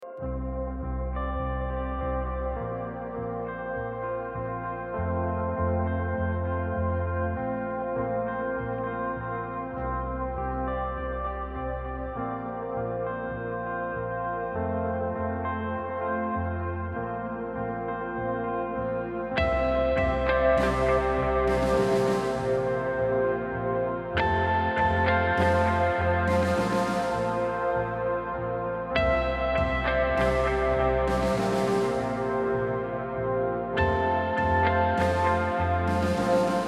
Heavenly Drone; Noisebiscuits

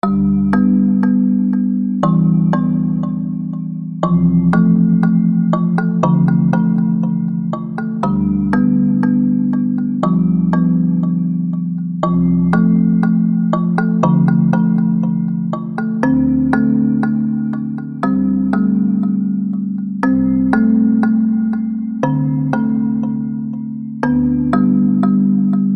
Backgrounds; Noisebiscuits

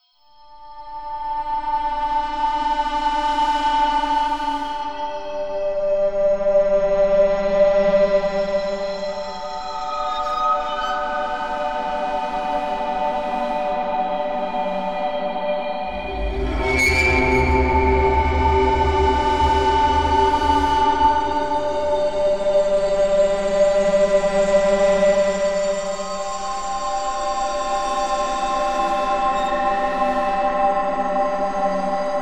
Unlit Finish; Noisebiscuits

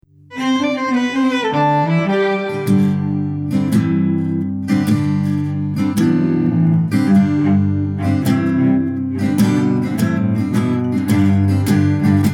Fiddlers Jig; Noisebiscuits

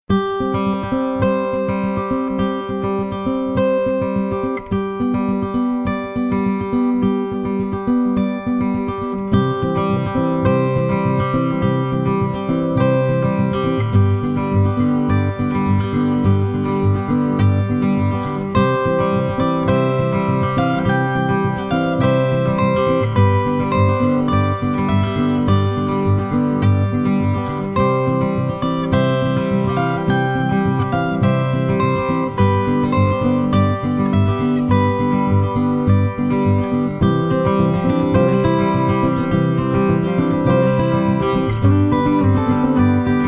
Wren Party; Noisebiscuits

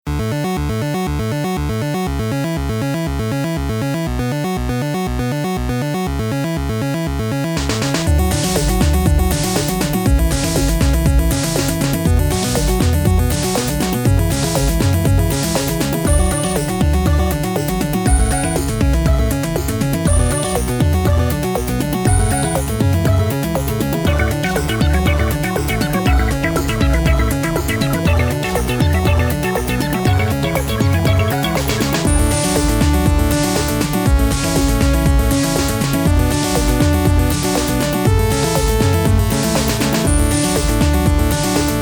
Wish and Chips; Noisebiscuits

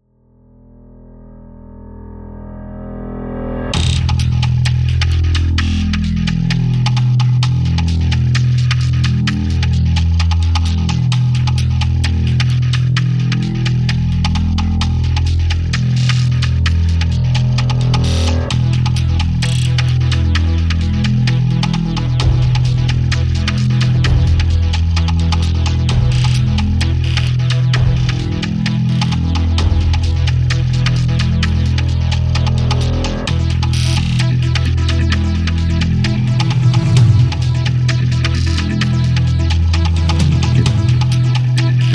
Idle Threat; Noisebiscuits

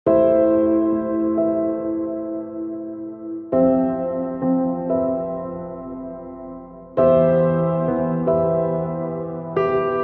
Sad News; Noisebiscuits

